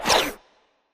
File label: space tile flip back